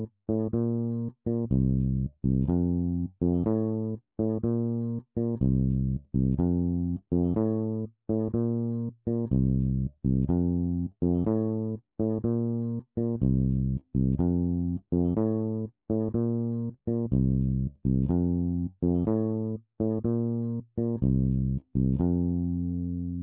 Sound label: bass